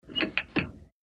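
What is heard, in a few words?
British Call Box Receiver, pay phones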